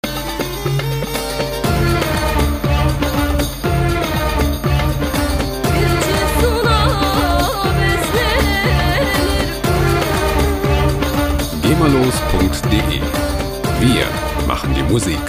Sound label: oriental world